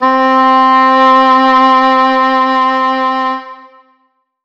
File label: Flute 3 - C; 99Sounds; Monosounds; Music Loops; Instrument Oneshots